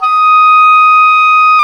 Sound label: oboe, Roland Orchestral Winds; Oboe Brth; Oboe 1